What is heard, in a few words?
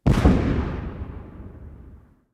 tank explosion far; explosions; War Thunder; tanks